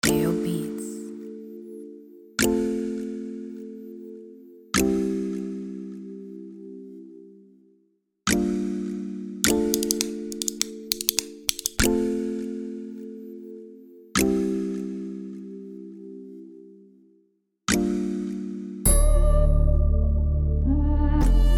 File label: Type beat